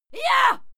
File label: female fight scream yah; schreie; menschen